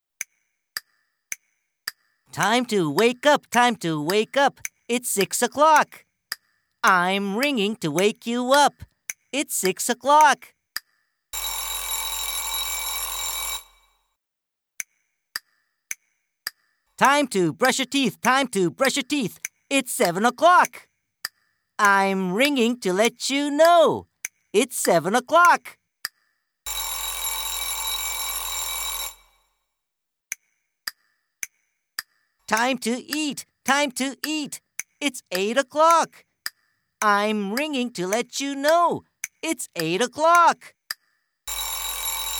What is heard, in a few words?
CHANT Time to Wake Up